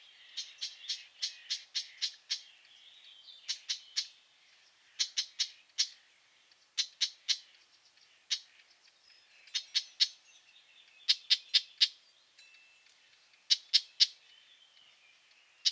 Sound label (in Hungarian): tövisszúró gébics Lanius collurio